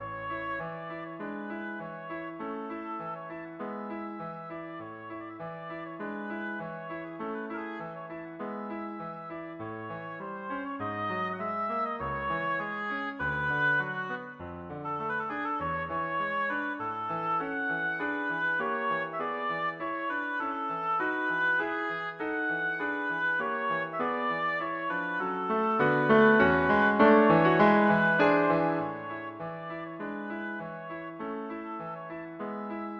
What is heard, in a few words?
chopin nocturne, oboe